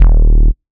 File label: Bass D#, techno synth shot; Bass One-Shots; Ghosthack; Techno Synth Shots 2025